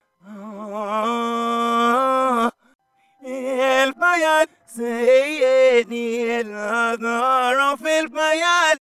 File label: Reverse vocal